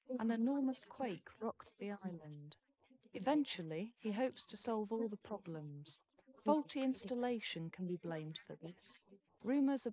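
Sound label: female, instantaneous mixture; SiSEC11; underdetermined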